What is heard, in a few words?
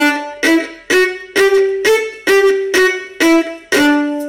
staccato